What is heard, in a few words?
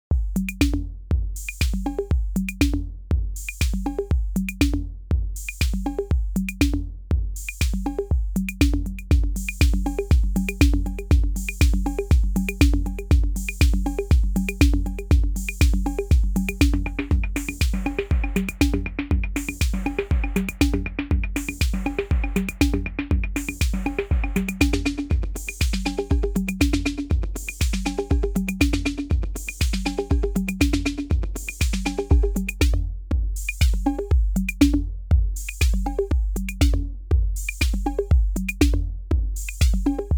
DELAY; ARTURIA; ETERNITY